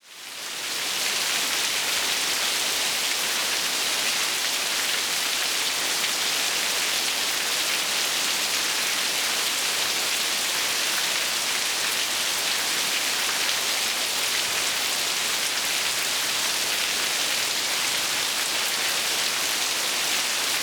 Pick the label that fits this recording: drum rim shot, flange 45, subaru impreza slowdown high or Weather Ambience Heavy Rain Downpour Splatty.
Weather Ambience Heavy Rain Downpour Splatty